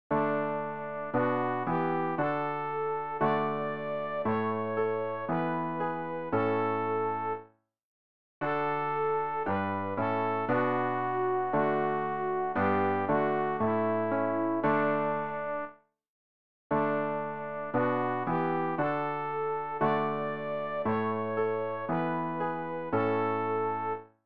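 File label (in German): sopran, Übehilfen; Ref. Gesangbuch; RG 092: Mein ganzes Herz erhebet dich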